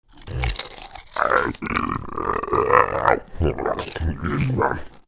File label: pig eat